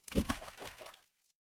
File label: water splash